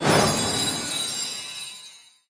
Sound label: sticky Wild Re Spin; fairyred